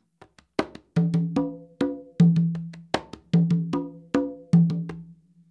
guanganco, congas

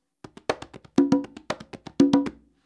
rumba, congas

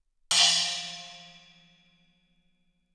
K splash, cymbales

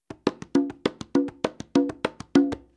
fast rumba, congas

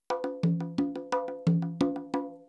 tumbao, congas

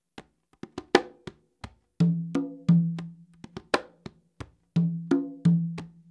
cha cha cha, congas

